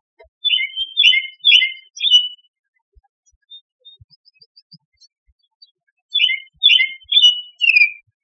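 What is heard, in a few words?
American Robin song; AMRO; Tigard OR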